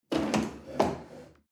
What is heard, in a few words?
chair wood place slide medium